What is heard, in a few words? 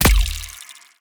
earth prick